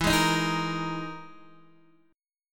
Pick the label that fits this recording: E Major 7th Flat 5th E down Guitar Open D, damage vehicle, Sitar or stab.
E Major 7th Flat 5th E down Guitar Open D